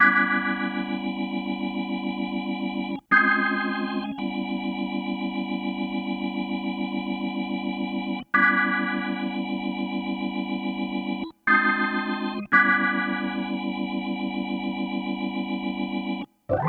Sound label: Cruisin' Disco Organ, 70s Analog Funk; Sonic Foundry (Sony Creative Software); What it Is!